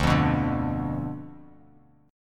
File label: D Minor 6th